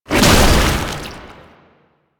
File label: Proto Infested Babau Underground Attack Stop, Enemies